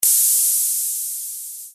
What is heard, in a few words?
Egg Sizzle Sound